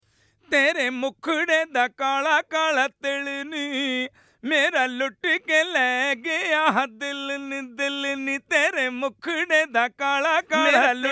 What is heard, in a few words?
vocal